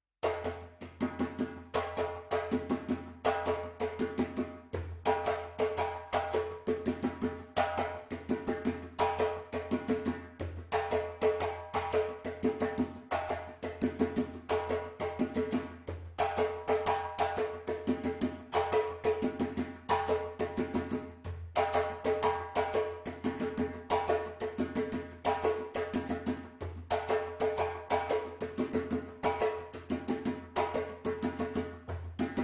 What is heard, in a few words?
timbal